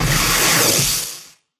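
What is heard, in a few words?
impact bullet energy titan shield arc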